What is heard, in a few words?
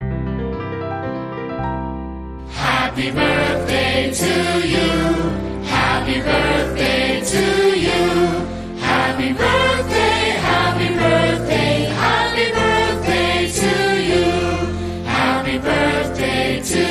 Adult and Children Vocal Happy Birthday